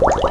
Bubbles; Bolo

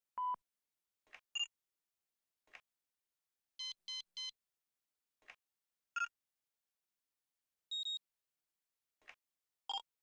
3 Beeps; SGI O2 Out of Box Experience 2.2; cyber astronomy

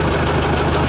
Wheel Unit Move; Red Shark

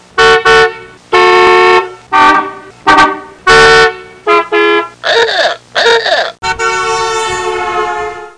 car horns; The Mustang Anthology 1999

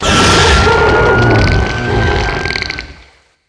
cetus die; DAoC; Toa

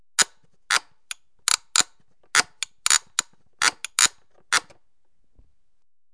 BABY

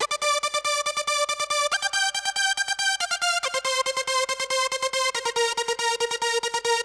Tranc E4, Melody; Techno; TechnoMusicMaker

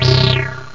juno bass, synth

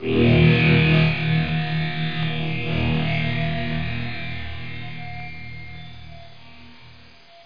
GUITAR; darts

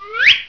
BARREL

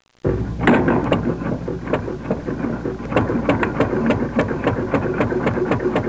Cement Mixer; Soundsation

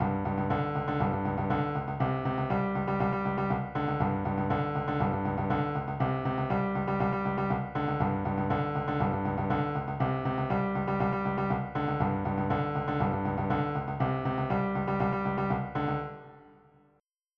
Reggae

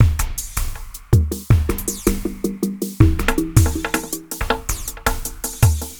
DRUMLOOP, AMBNT, 160; Ambient

